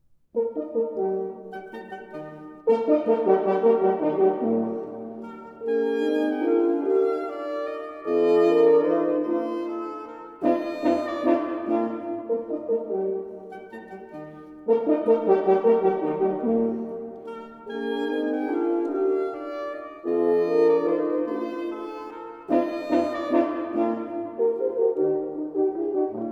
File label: oboe, 3 horns & bassoon; E flat major; minuet fragment; Quintet; Hess 19; Beethoven; Musik für Bläser